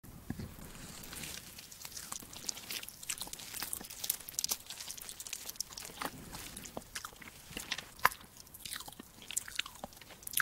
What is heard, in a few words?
fast food, خوردن